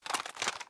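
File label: inspect movement; Valorant; phantom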